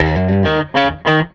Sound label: Strat Guitar Loop